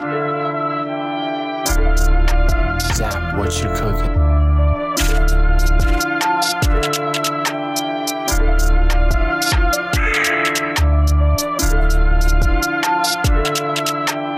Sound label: Steppers In London, beat